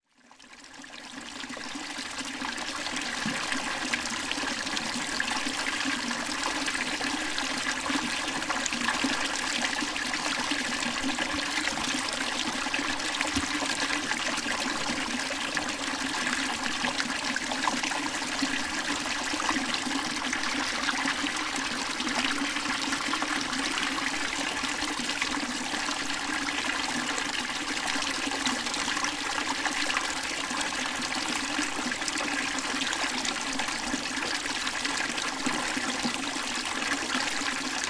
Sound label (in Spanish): Ara Janovas Fuente